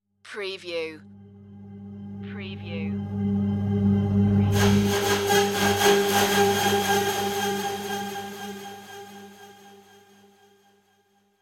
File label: shiny gleam revelation